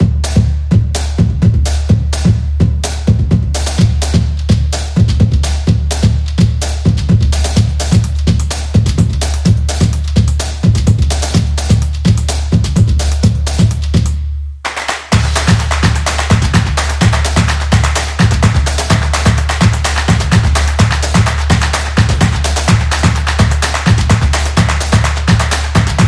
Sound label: The Drums